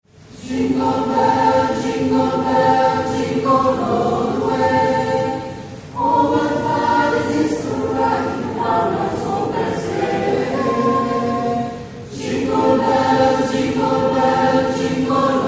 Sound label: jingle